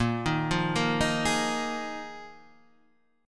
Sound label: D sharp Suspended 2nd Suspended 4th; 6,6,3,3,4,4; chordshape